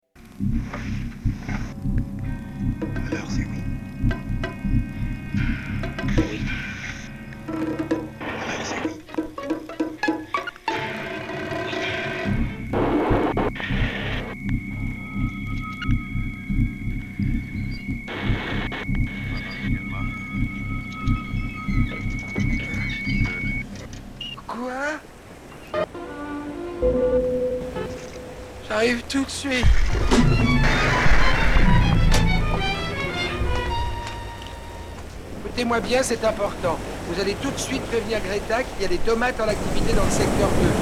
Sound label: noise; noise-hunter